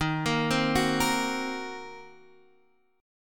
Eb Minor 6th, Guitar Open D

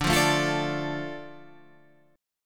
D Minor D, chord

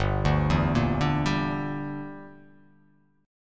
G Minor 6th; Gm6 arpeggiated; JGuitar

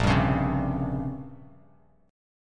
A sharp Minor 6th Add 9th, Bass Standard; strummed; 6,10,11,5; JGuitar

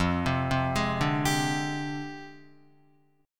F Minor 6th Add 9th, Guitar; JGuitar

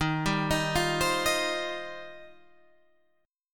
D sharp 6th Add 9th D sharp Guitar Standard; D#6add9 arpeggiated

